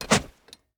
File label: salvaged axe attack; Rust